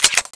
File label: clip out, weapons desert eagle